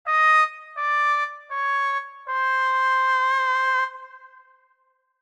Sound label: fail trombone